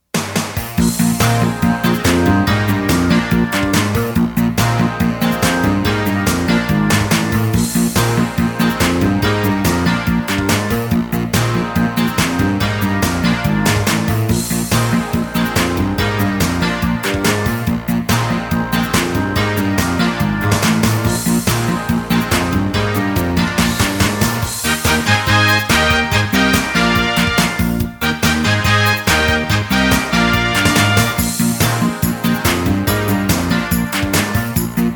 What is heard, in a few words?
accomp track